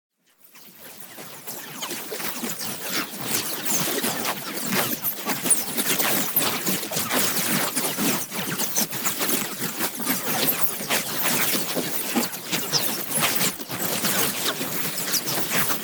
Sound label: PARTICLES SMALL FAST Nanobots; boom library; Neon